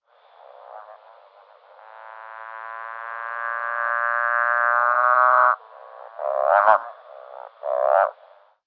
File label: motorbike frog; sounds of the wild